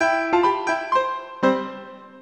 欢快结束; LockChime; 特效音

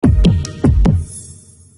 奥迪心跳声; LockChime

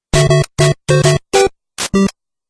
开始; LockChime; 动漫; 超级玛丽

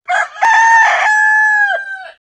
鸡; LockChime; 动物

